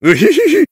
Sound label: heavy laugh evil